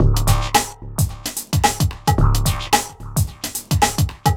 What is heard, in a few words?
shuffle beat phunk; Sonic Foundry (Sony Creative Software); Ugly Remnants VOL-2